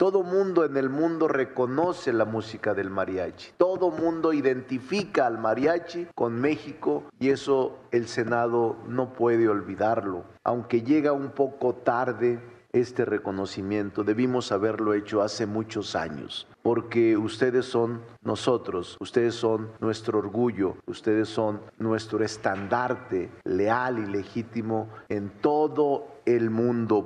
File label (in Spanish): MARIACHI